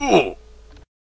classic hurt; minecraft

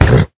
hit, horse; minecraft

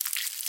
step, silverfish; ayuncraft-1.5; mob

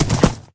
gallop, horse; minecraft

